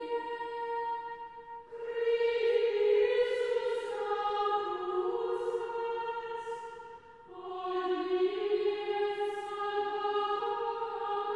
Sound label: Chant Antiphon; Traditional; Chester Processional